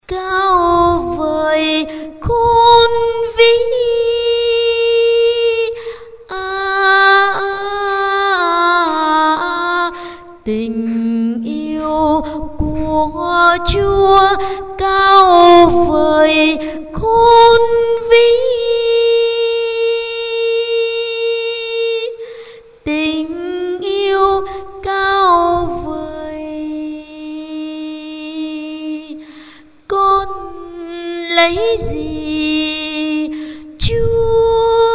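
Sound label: soprano alto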